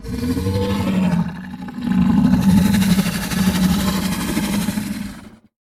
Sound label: Grounder Death Long; Evolve; Kraken